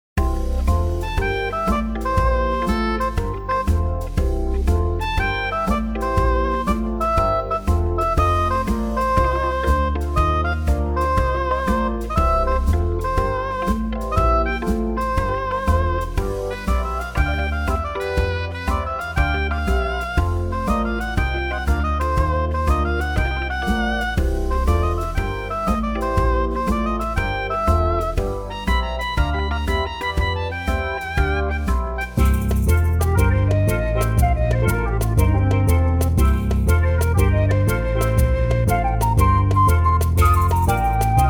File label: MIDI blues